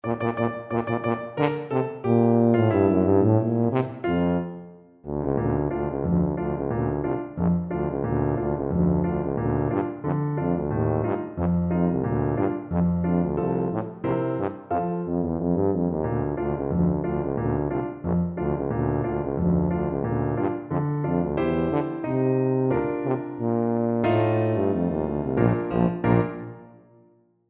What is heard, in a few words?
radetsky march, tuba